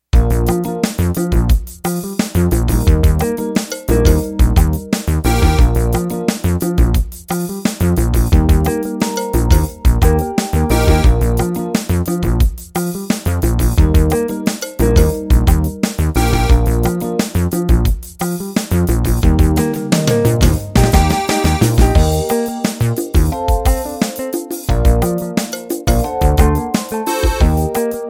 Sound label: 16beat